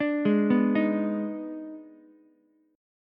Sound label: D sharp Major 7th Suspended 4th Sharp 5th D sharp Ukulele, chord; arpeggiated; 7,8,7,6; JGuitar